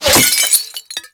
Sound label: Glass Impact; Warframe